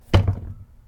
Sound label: put shoes